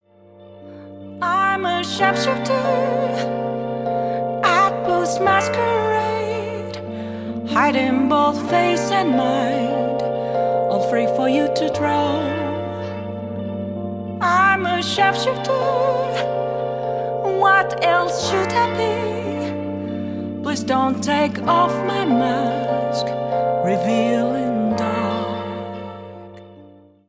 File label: Chorus